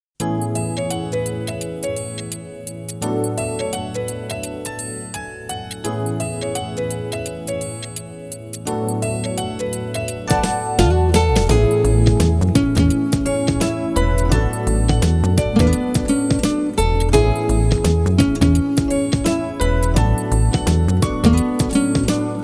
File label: bgm